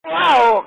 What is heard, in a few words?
woaaah